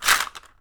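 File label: SHARK CALL, ethnic percussion; WorldSounds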